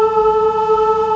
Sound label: CHOIR; CMI Sound Library Rev 1.3; HUMANS